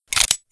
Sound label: colt clip